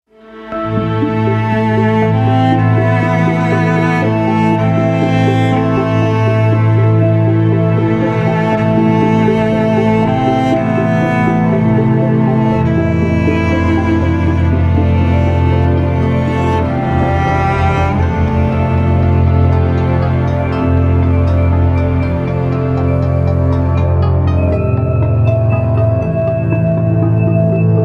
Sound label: cello